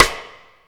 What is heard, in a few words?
shiny snare c sharp key, Loudest frequency: 2525Hz